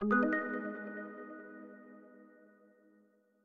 Mechanic Progression Stinger

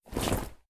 Clothes Drop; Legend of Keepers (gamerip) (2019)

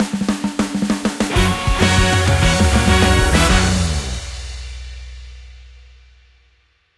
Victory Jingle 98 4-4; BountyBoard

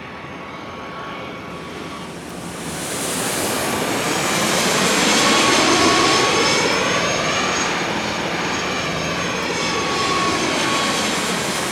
Boss Losing Altitude; Burnin' Rubber 5 HD

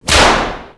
Snap - Loud

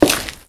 Concrete footstep

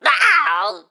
Pecheneg Gray Death

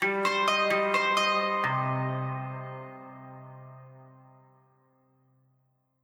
Loop Win

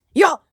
Attack

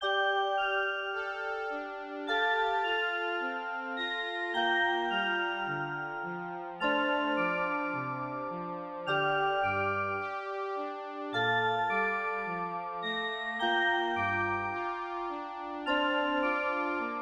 Train Song - Jingle

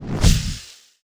Teleport Out

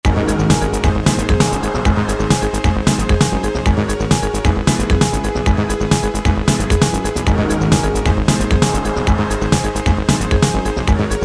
bgm stage

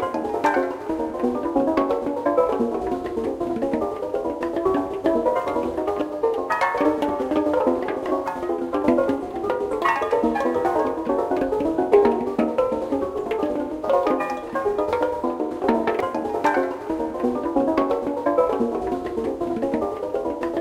Music Loop; Infinite Hole; Music Loop 1

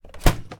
Photocopier Lid-Close

